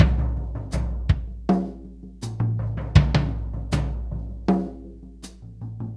Tom Groove, Laidback

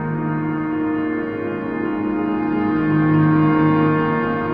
Piano Blur

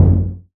KODO, JAPANESE

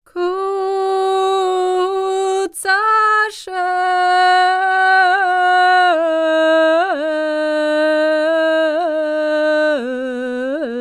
SERBIA, Vocal World Voices SERBIAN; ILIO; Vocal Planet VOL-5

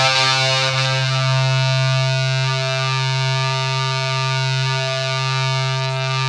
ZWEEP; Spectrasonic Distorted Reality 2

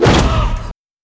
slapper, fx & sweeps; EZRollers

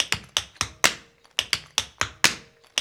African Tap; Spectrasonics; Supreme Beats

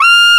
SAX TENOR; Roland LCDP07; Tenor V-sw; Tenor 3way

